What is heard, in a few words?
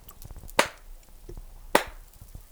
Acipenser oxyrhynchus, sturgeon snap series; kennebec; augusta; soniferous